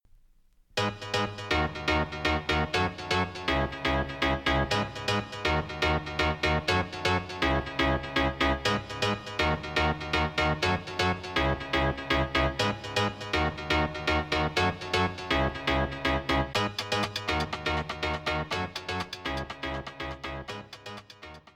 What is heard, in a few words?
Synth stab